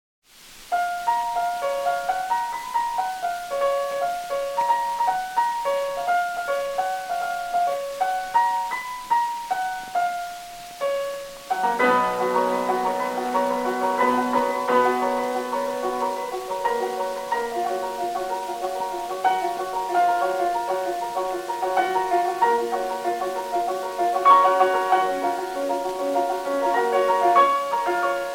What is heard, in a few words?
Gambangan